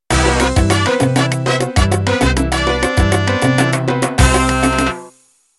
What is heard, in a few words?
Salsa Intro